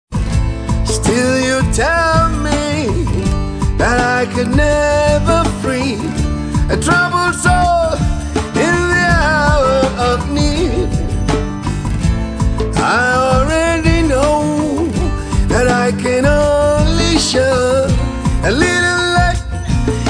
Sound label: Acoustic Track